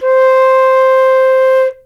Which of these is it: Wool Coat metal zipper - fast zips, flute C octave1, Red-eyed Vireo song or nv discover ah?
flute C octave1